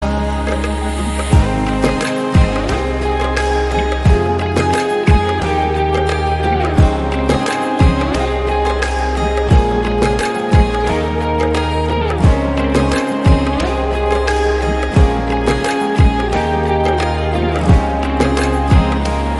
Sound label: Instrumental BGM